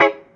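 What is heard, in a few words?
guitar chord C# minor, roots reggae; Sounds Good - Roots Reggae; GTR CHD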